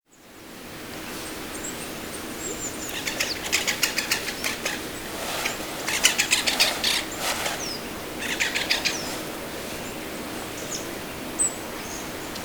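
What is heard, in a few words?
Great Spotted Woodpecker; akagera; tomakomai; Haruo Kuroda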